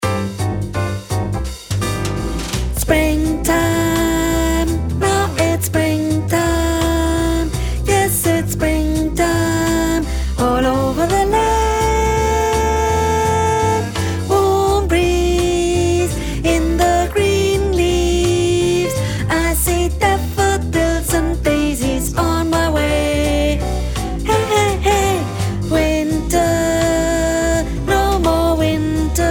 Sopran

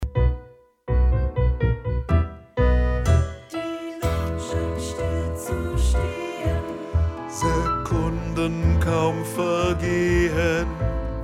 Tango-Tanz - Tenor

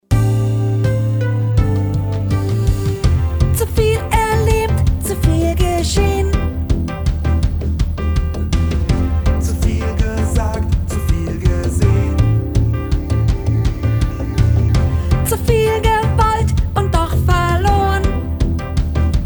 Sopran

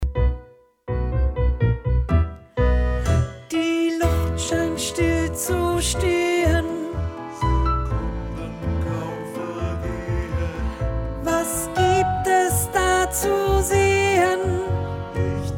Tango-Tanz - Sopran

